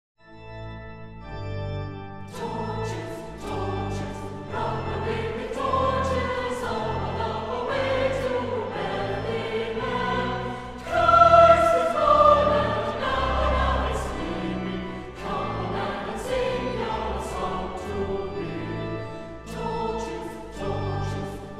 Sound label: Chorus; Torches